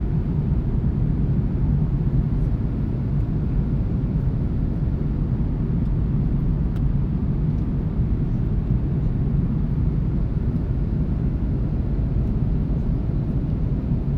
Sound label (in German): Flug nach Kathmandu; Flug TK 0726